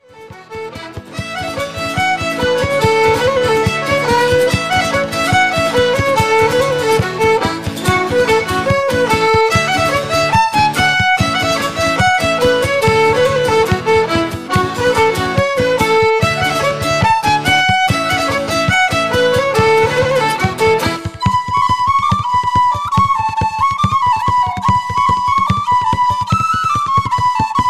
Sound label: smart polka